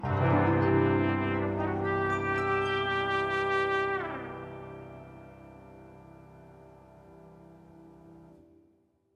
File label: Sting; lego the incredibles 2018 pc; Mx Hub New Area Reveal